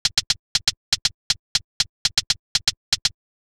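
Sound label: Closed HH 2, closed hi hat; hydrogen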